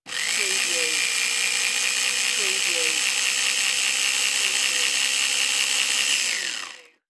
Blender On Run Off; sound-effects-bible

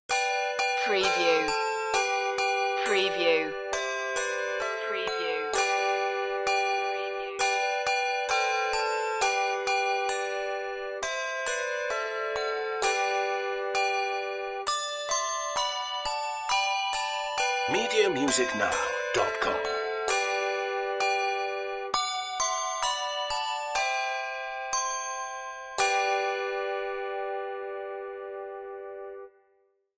handbells; good king wenceslas

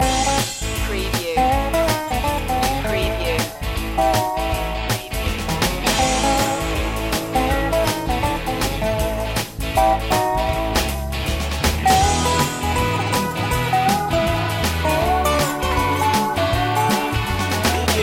dobro shuffle loop

